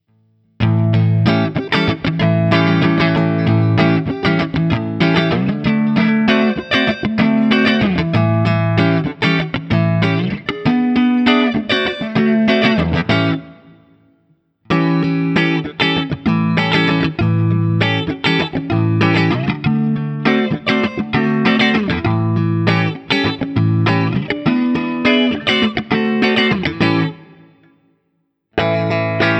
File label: Guild T250 ODS100 7th; guitar